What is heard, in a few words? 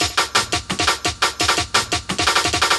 Loops, breaks; M8